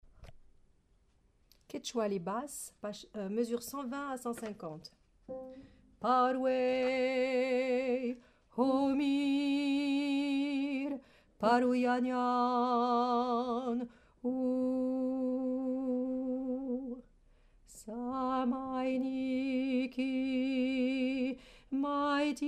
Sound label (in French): Basse; quechua